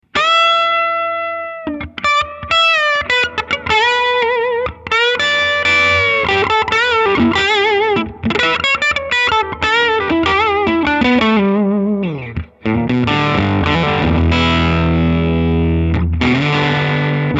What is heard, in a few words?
ibanez dirty; swampdonkeyamps; moosette